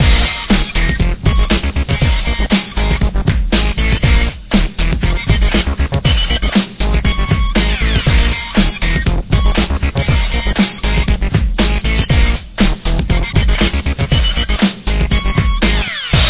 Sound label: Lab Background; Pixel Gun 3D